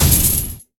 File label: Chain Cut; Hollow Knight